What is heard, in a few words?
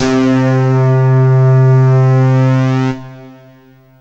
CLEAN SYN; SoundFactory; SMPMANIA